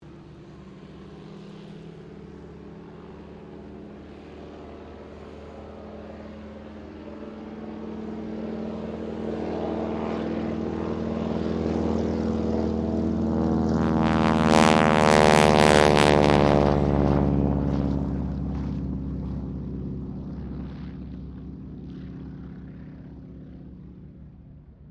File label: AIRCRAFT AT-6D HARVARD MK III TAKEOFF STEREO; Temora Harvard MK III; Airplanes